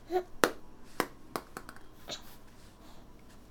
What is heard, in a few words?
Throws Ball; Stan